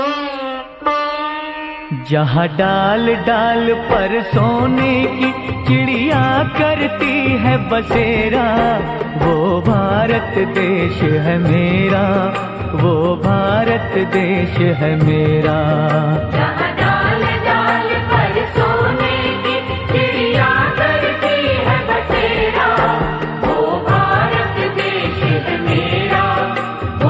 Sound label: desh Bhakti song